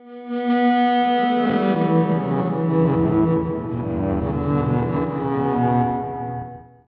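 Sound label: sax melancholia; loops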